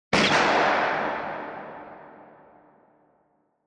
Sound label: Gunshot